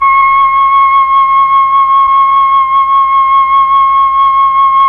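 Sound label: Mellotron Flute C#6; Tron Flutes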